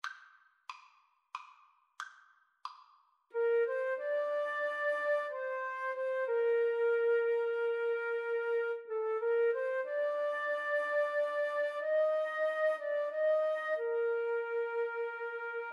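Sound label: 2 flute karaoke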